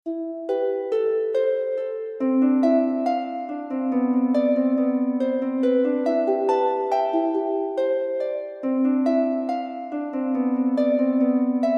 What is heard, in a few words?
fantasy feerie